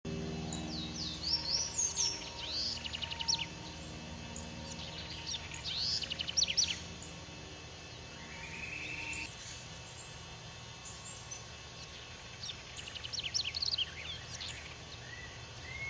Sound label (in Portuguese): Canto da CORRUÍRA